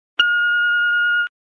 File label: Modern Phone Machine Beep